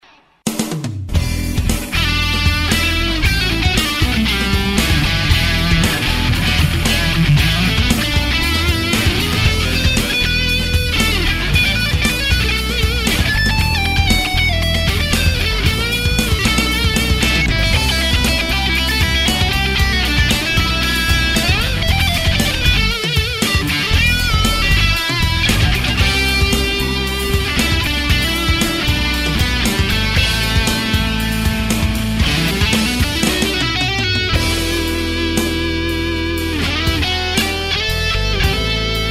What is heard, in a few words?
Axefx Jams